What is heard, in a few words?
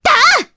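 Vox Attack Japanese; Mirianne; kings raid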